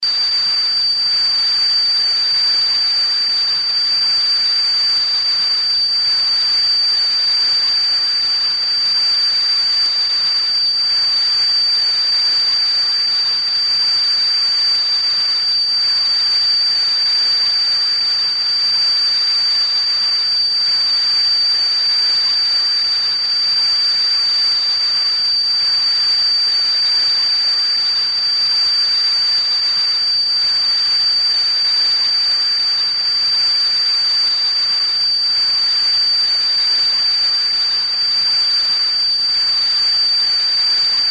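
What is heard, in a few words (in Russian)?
chainik, kettle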